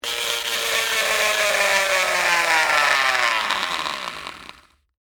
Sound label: Bicycle Tire Spin, Slow, Down, With, Card; Texture; Spinning; BlastWave